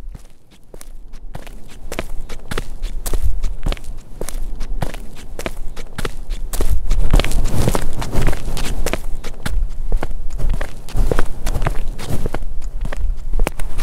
Footsteps on Concrete

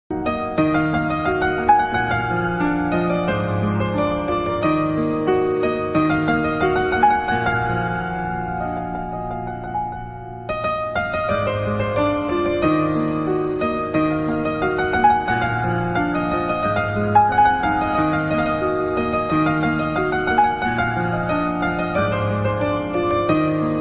Instrumental

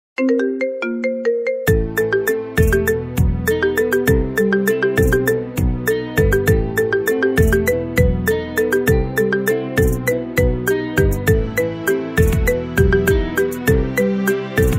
Marimba Remix

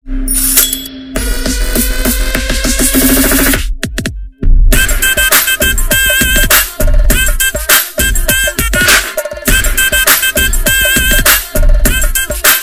Bgm Telugu; Mr Majnu

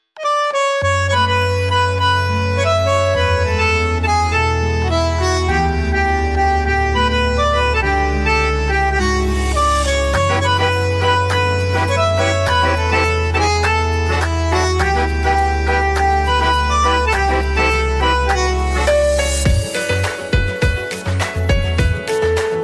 Instrumental Guitar Version Ringtone

